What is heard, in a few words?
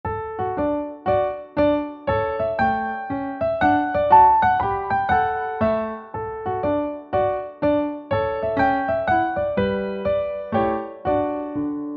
Menuetto; Haydn